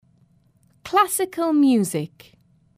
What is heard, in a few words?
classical music; baby mozart